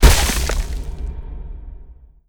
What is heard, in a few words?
boss final hit